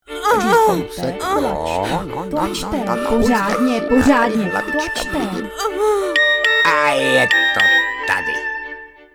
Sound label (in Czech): porod